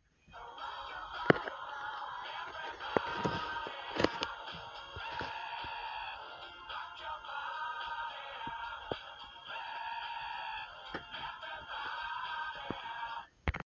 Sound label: scream remix